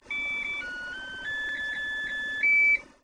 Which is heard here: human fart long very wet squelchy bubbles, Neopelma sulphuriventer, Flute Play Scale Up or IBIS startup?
IBIS startup